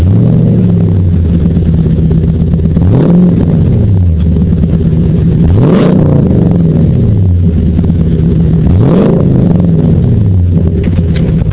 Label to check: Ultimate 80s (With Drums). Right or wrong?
Wrong